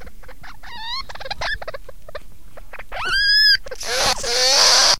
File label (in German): streit, octodons